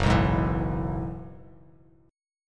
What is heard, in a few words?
F sharp 7th Suspended 2nd Suspended 4th F sharp down Bass Standard; F#7sus2sus4 strummed